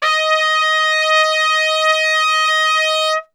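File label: D#4 trumpet straight, brass; Best Service; Brass Super Section; TRP STRGHT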